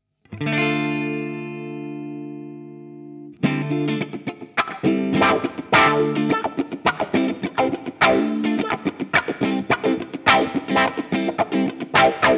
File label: Clean, wah wah